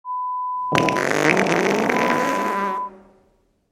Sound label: Fart sound